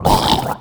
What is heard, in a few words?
angry gurgle